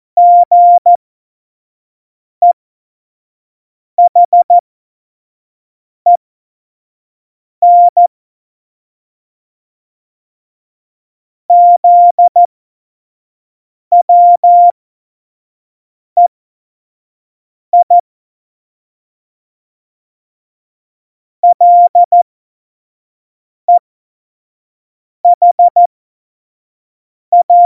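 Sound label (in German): Witz, cw morsen; Morsekurs; Witze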